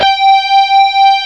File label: guitar G5; AKAI S-Series CD-ROM Sound Library VOL-8; SET#1 GUITAR